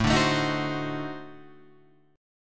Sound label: D 7th Flat 9th A, chord